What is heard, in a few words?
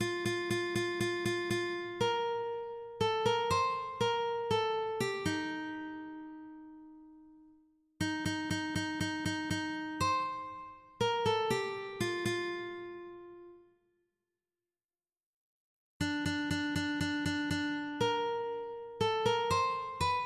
ukulele